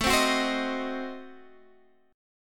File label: Gb Diminished 7th, Guitar